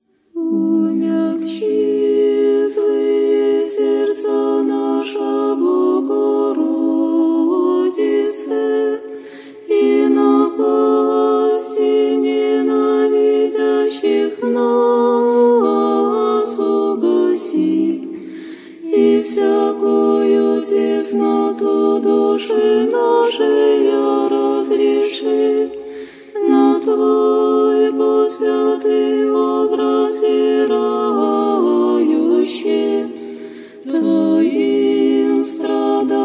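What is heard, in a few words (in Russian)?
хор православный женский молитва; hor pravoslavnyy zhenskiy; molitva bogorodice umyagchenie zlyh serdec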